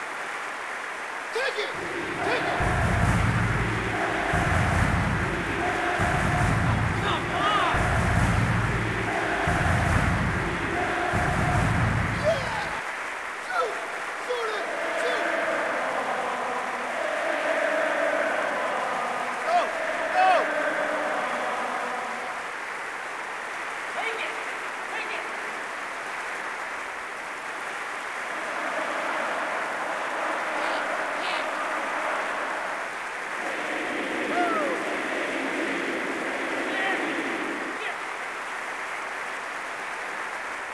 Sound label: football; firefight